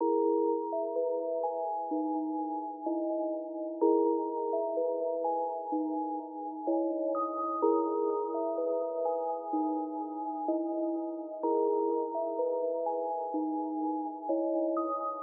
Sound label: Trance Trap Loop 126BPM E